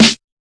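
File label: classic hip hop snare; Classic Hip Hop Mega Kit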